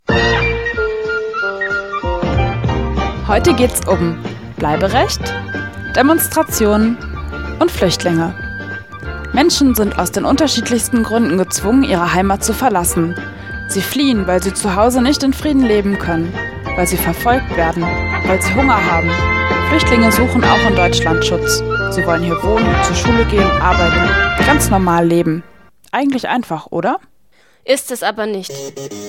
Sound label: jingle